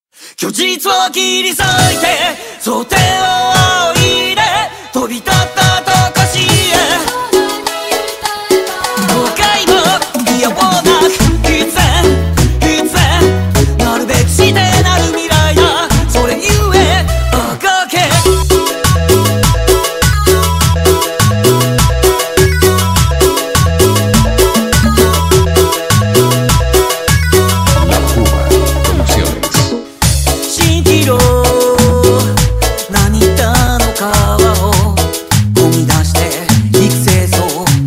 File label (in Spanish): Version Cumbia